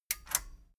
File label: metal button stereo click disable medium